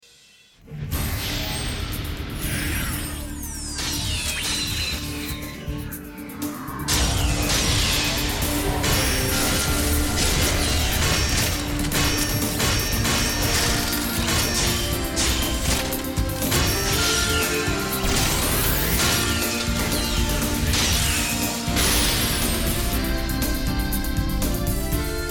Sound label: instrumental